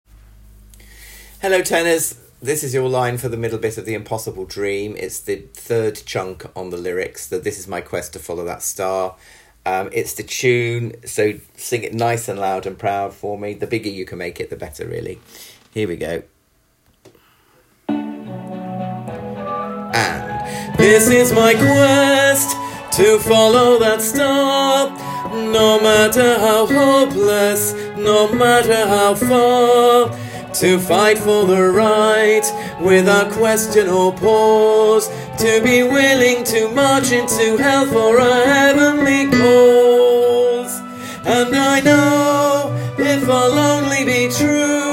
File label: TENOR; Tenors